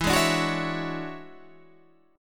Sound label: E 13th, Guitar, Standard; strummed; JGuitar